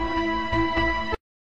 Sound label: low pit